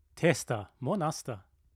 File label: Scared; Cornifer